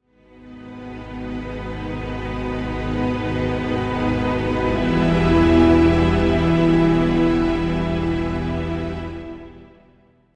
Music transition - Mysterious synthesizer string chords; Hollywood Edge Sound Designers Tool Kit